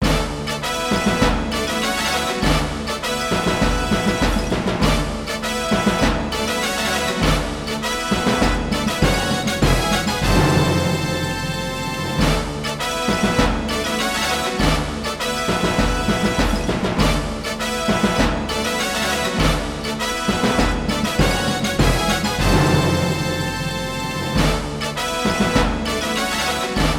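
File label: BGM Gaikou, diplomacy; Sangokushi 2; 3DS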